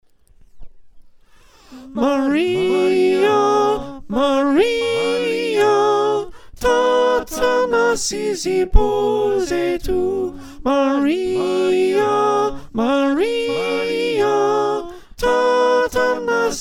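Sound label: soprano, choir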